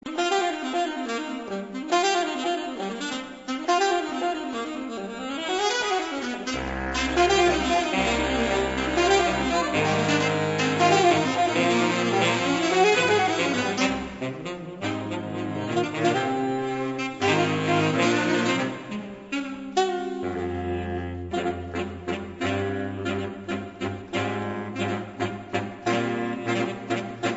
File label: sax jazz; sassofono